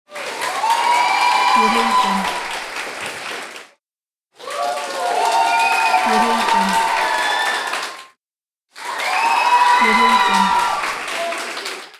cheers applause audience

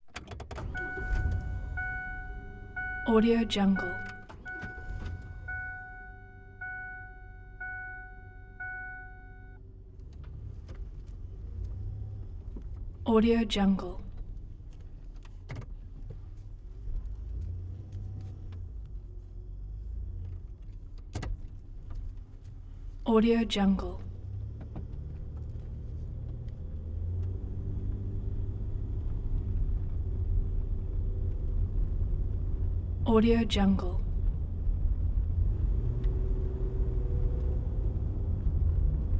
ford explorer 1996; prosoundfx

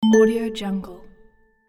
notification blip

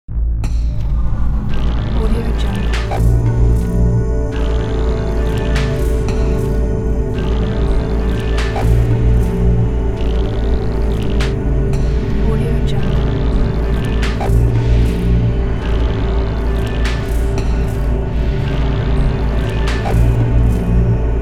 horror cinematic suspense music

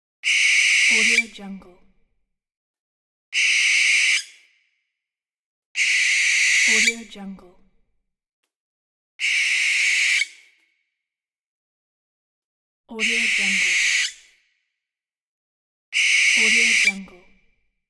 barn owl

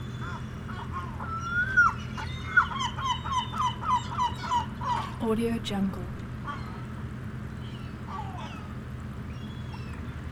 dock seagulls ambience

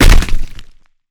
elemental rock impact hard tight; soundmorph

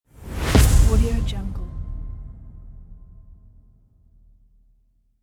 dramatic explosion stab; applehillstudios

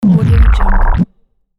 retro power down

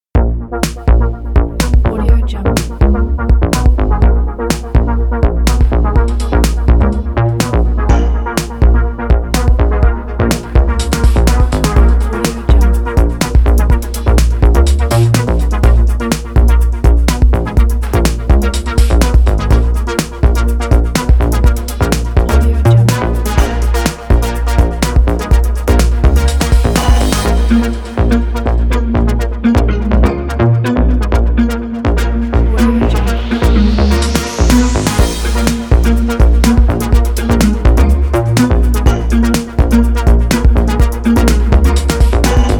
powerful electro

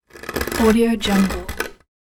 finger nails scratch

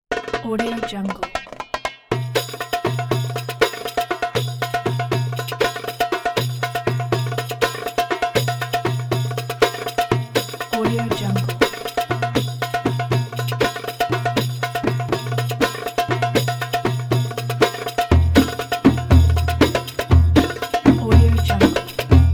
turkish percussion

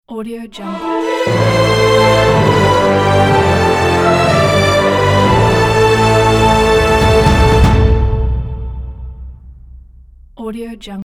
epic orchestra logo

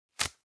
velcro open fast; foley; smartsoundfx

